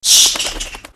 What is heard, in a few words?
bat one; bonny betty